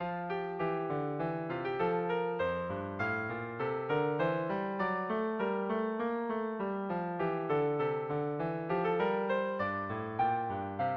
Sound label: menuet